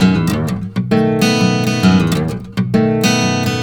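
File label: baritone riff, Blues Guitar; ILIO; Fretworks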